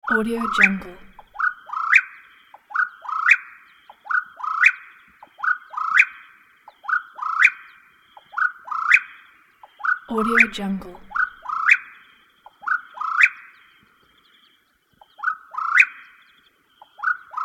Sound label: whippoorwill constant calling; sound ideas